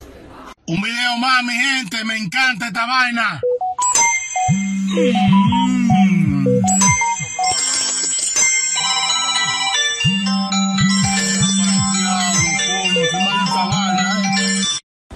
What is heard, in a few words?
goofy guy eating